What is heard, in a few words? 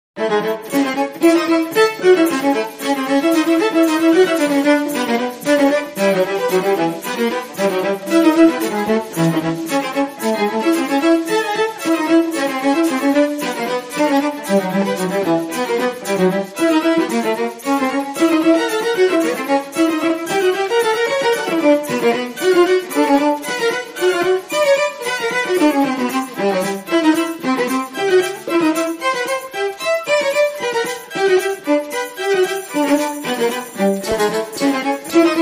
Irish Pub, Percussion and Lead Melody